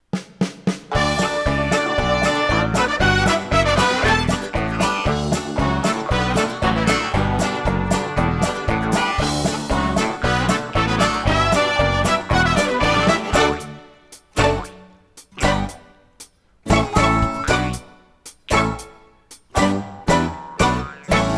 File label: Instrumental Only